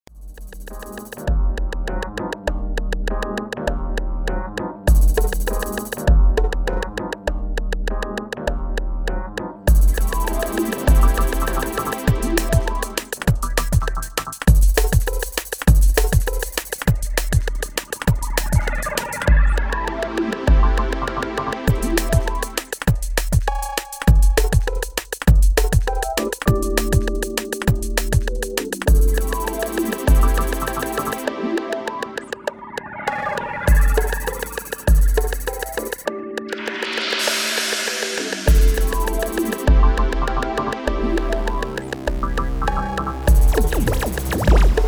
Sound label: Milkwood Instrumental